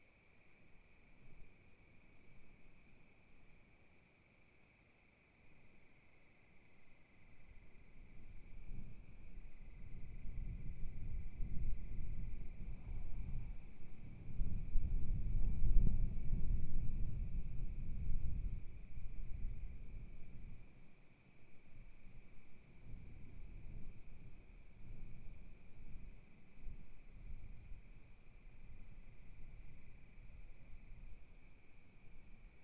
Crickets Kiger Lookout Night Crickets Gusts Gentle; Wilderness; Oregon